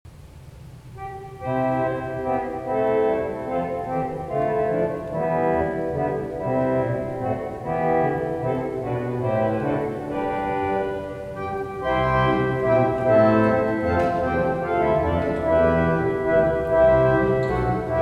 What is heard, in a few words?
Mannborg, pipeorgans